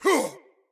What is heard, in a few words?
attack grunt; smite; god odin